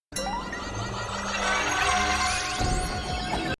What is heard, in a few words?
wonder flower collect sound